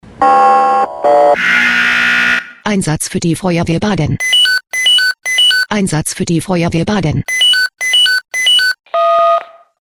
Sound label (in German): SMS Alarmierungstone; FW Baden